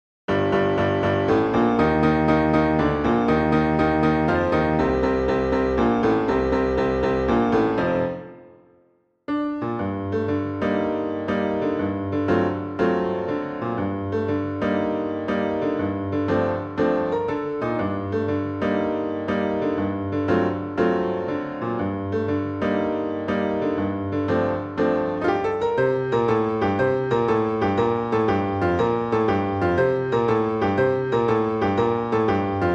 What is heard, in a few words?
miniature in jazz, piano; pieces pour piano